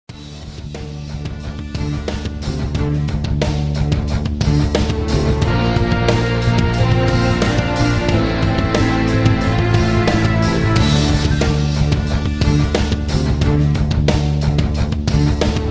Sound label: bgm main; fps3d